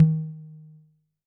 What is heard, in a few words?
Tom